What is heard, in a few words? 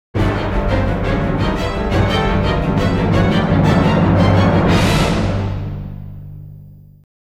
Agent secret link; Jingles medley